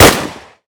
mp44 Shoot; Minecraft; Infamy; Last Alive Pack

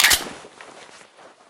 dual pistol clip in; weapons; Left 4 Dead 2

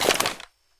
rifle helping hand retract; weapons

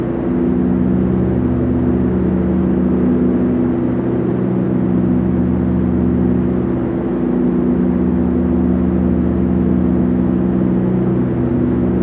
distance airplane heavy idle; Hearts of Iron IV Death or Dishonor

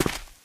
concrete, footsteps survivor walk; Left 4 Dead 2

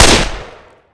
rifle fire, rifle desert; weapons, gunfire

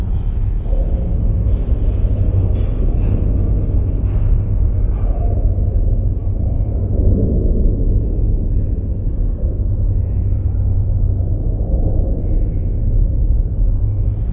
mall ambience loop

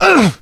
man hit; Mount&Blade Warband

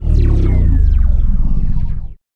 cruiser hurt; Sid Meier's Civilization 4 Complete; Beyond the Sword; Final Frontier; Units

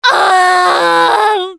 Hurt Critical, russian

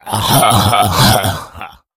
become alert, infected

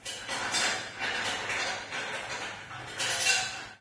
metal rattle; materials; Garry's Mod; hl2

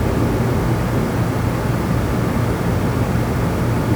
small room tone ambience loop; ambient; Left 4 Dead 2

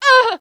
woman hit; Mount&Blade Warband

